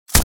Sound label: gun; UltimateShooter